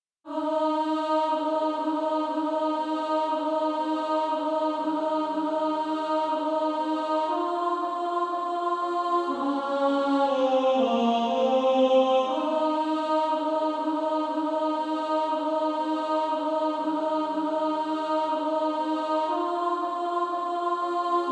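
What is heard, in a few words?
Female Choir, alto 2; On Christ the Solid Rock I Stand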